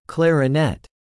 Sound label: clarinet